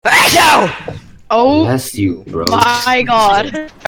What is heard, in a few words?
sneezey